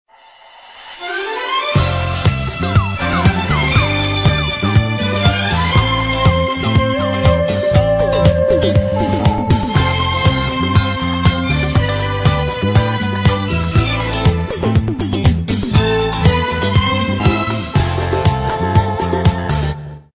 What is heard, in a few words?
disco fever; Alcatel OT-756